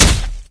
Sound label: foot, antlion; Source Engine; video game; npc